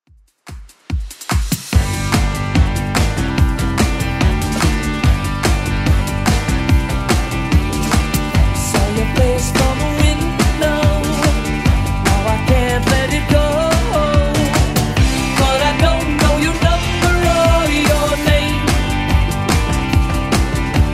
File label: 80s Club 145bpm; 80s Club Edit